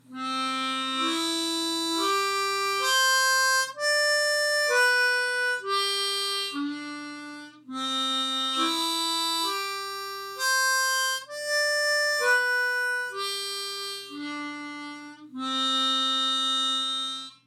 Single Note Exercise Holes 1 to 4, isolating single notes, harp